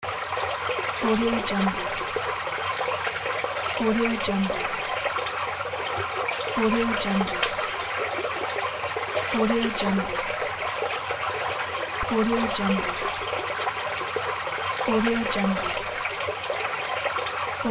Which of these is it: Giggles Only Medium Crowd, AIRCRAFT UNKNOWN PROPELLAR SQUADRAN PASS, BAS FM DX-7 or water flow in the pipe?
water flow in the pipe